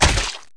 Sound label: hit egg